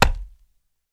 Body Impact Punch; punches kicks hits bones

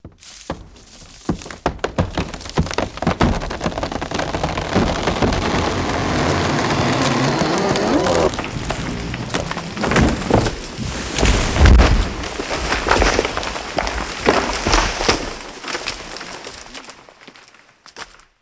Tree, Large, Falling

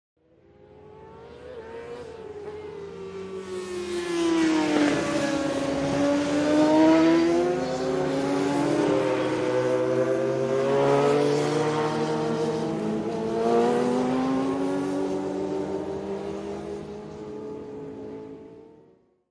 Motorcycle Road Racing

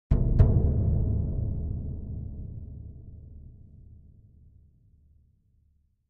Drums Toms D Deep Acce

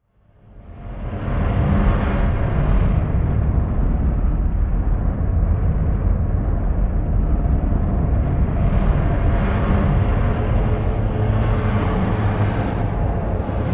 Haunted House Comes Alive

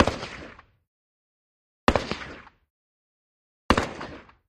Russian SKS Single, sks 7x6

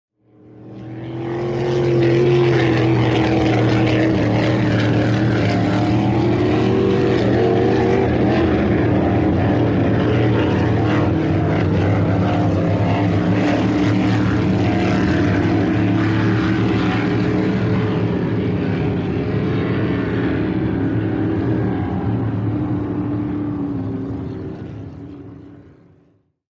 Power Boats By Fast C, off shore power boat

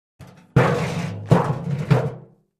Garbage Can Metal On C; barrels buckets & cans

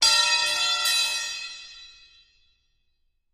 Impact Metal Crowba, crashes

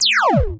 laser; lasers

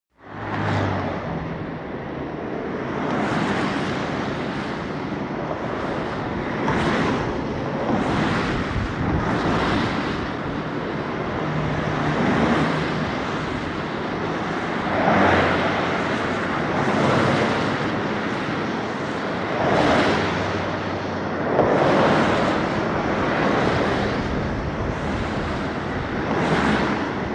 Vintage Highway Traf

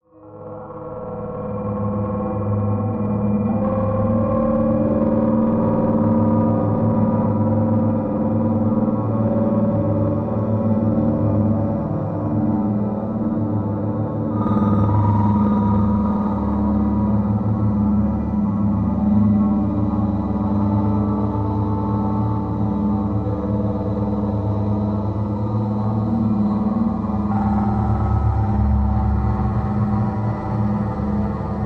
Pensive Motion Space, sci-fi ambience

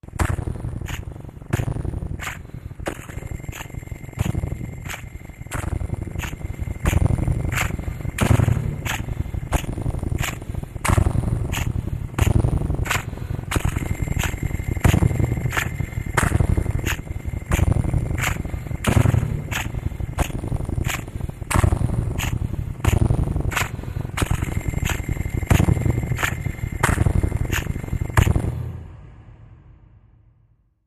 Mud Reducer Machine, pulsing

